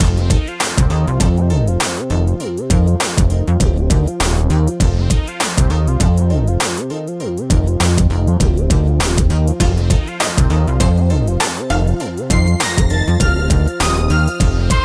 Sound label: menu rehearsal general